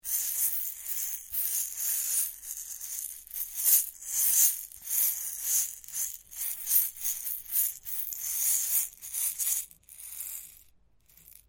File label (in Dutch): kerkuilen